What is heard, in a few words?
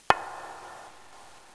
Step